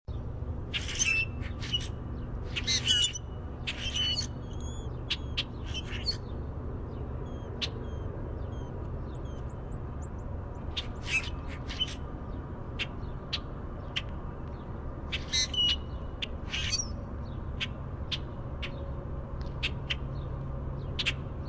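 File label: Common Grackle chack squeak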